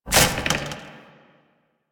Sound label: Tower Branch Movement; Warframe; Quests; TheNewWar; Ambience; Cetus; Unum Tower